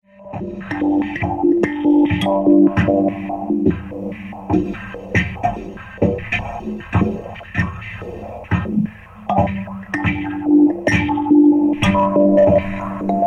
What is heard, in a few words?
kaolimba cajonlimba ambient